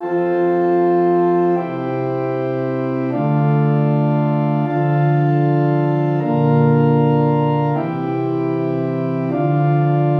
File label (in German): Stimmiger Contrapunkt; Ronge